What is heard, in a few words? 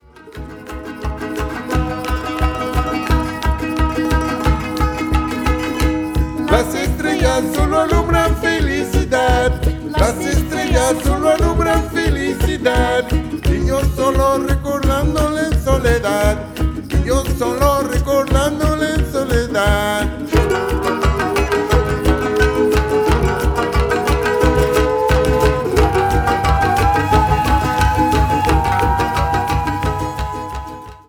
resonance sample